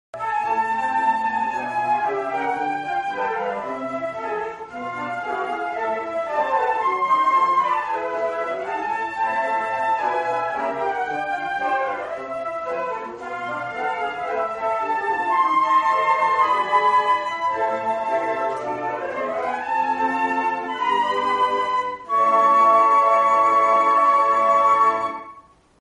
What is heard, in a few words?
concert, flute